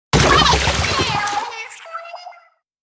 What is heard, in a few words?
Voice Girl Splatted